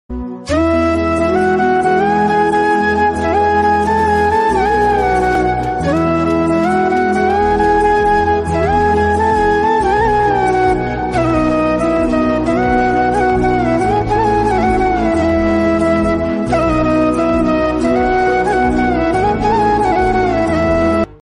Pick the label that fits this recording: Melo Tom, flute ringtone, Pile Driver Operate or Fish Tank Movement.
flute ringtone